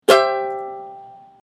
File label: uke sign in; AIM; buddy